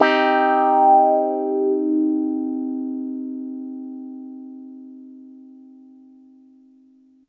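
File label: Piano Clav minor med; TrashLibrary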